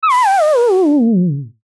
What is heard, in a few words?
fall away whistle; horror sf